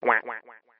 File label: duck button